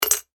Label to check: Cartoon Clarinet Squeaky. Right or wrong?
Wrong